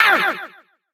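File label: Punter damage; Fight Knight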